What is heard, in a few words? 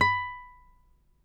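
nylon, FLAMENCO, Guitars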